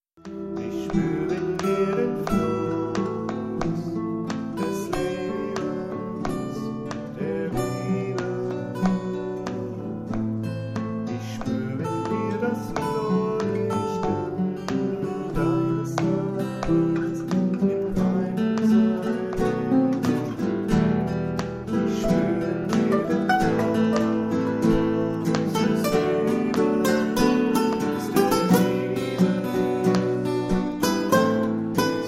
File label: Trio Impro